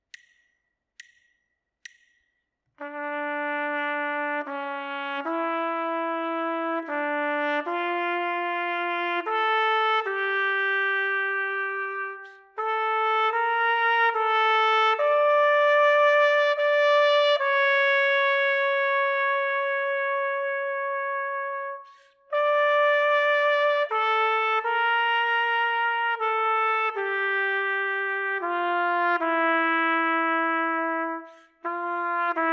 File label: melodic dictation, partially completed melodic dictation; ear training